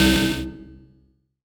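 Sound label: Rave Synth, Dance Mega Jungle Rave; Best Service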